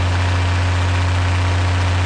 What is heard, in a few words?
engine